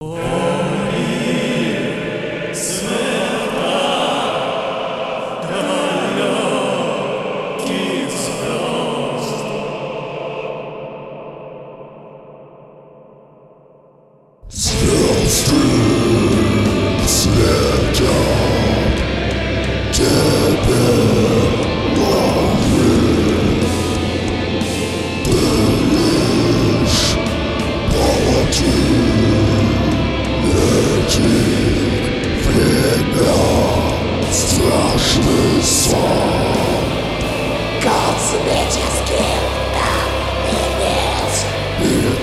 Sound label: space epos; Сессия